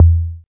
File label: tom drum single hit e key, tom single hit tuned to the E note. Loudest frequency: 100Hz